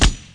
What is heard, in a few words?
shot; attack on blocks